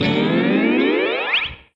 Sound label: Slideguitar Up